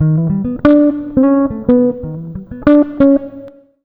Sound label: jazz, acoustic guitar loops; USB Soundscan vol.04 - Electric & Acoustic Guitar Loops; AKAI